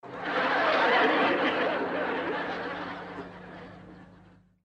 risas; chavo

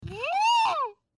grito

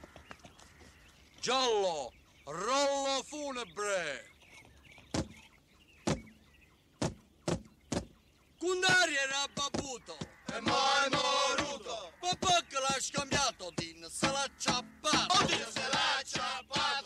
rollo funebre

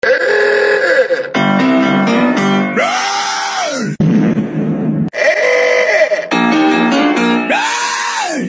sonido de piano

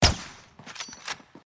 tiro sniper; warzone

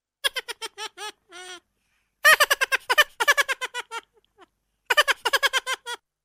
risa de raton

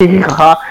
jijijaja

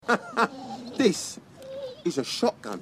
risa; this is a shotgun

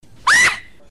grito

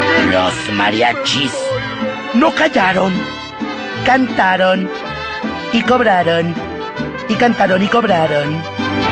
mariachis

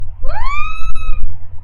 alarma

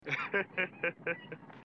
risa; eddie murphy

